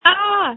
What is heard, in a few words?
Scream